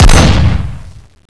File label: jack hammer